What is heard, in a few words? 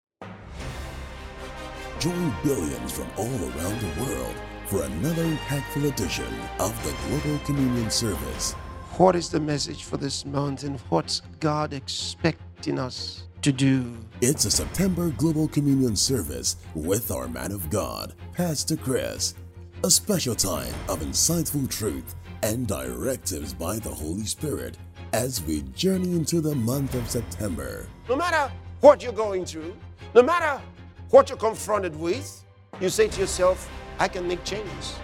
JINGLE